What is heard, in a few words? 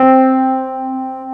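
Wurlitzer C4 - R; USB Soundscan vol.09; AKAI; Keyboards Old School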